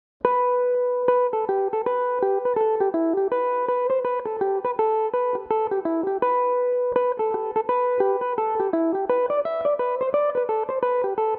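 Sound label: G major, mandolin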